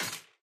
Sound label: block metal destroy